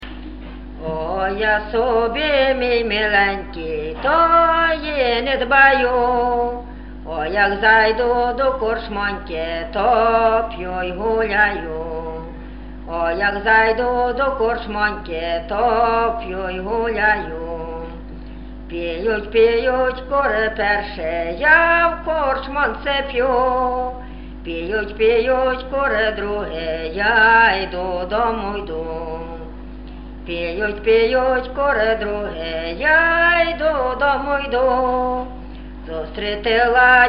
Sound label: Oj ja sobi mij mylenkij; Orchowek